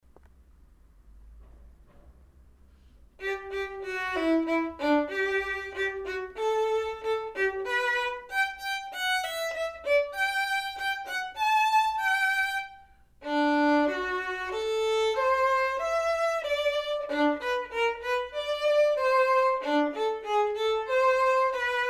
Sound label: vln perf; Building Technique with Beautiful Music, Book 1 Violin; applebaum